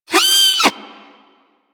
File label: Snow Critter Vocal Pain; Warframe; Hunting Critters